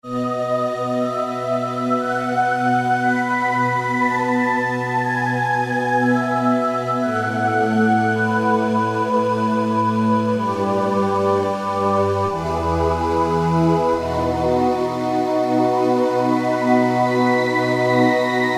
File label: Spacy Voices, Roland; Roland PN-D50-01